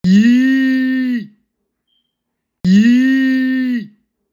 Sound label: eeeeeeeeeeep